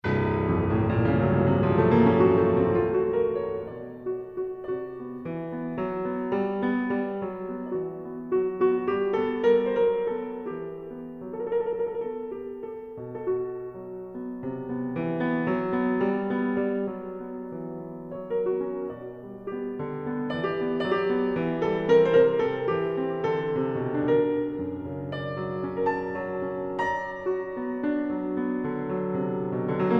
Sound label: Concerto For Piano & Orchestra, Una Dolce Lentezza; Caio Pagano; Autumn